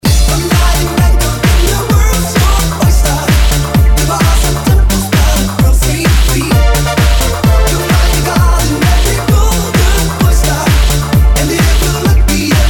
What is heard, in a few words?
BEAT